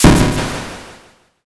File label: thunder magic